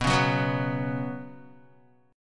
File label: B Suspended 2nd B down Guitar, Bsus2 chord; strummed; JGuitar